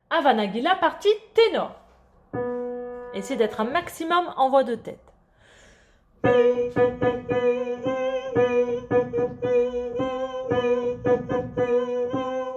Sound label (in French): partie tenor